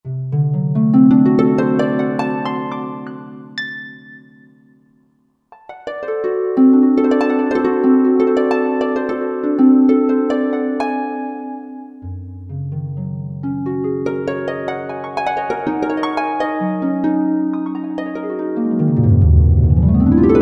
Harp On It; Roland JV-2080

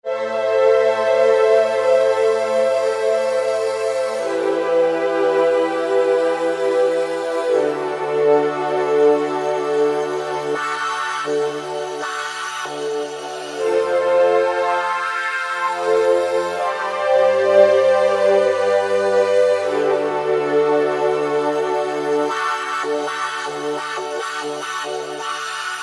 Sweep Bells, Roland SR-JV80-19 House Collection

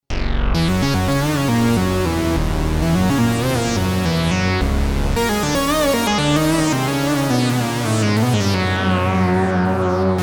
New Drone

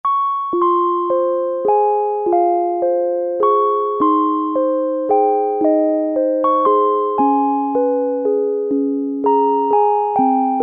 Celesta, Roland Juno-60; Factory presets

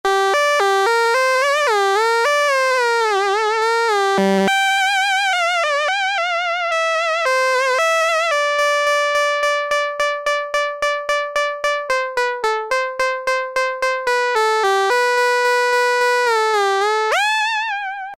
synthe 1-8', Korg M-500 micro-preset

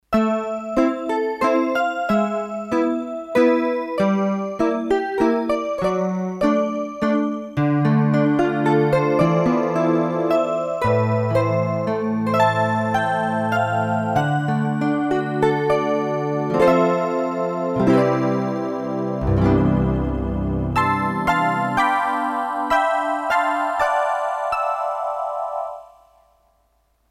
Atmosphere, Roland D-10; Factory Patches

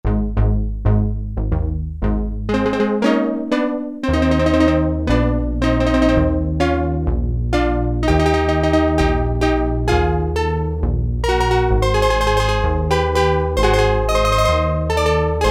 Steel Drums, Korg Poly-61M

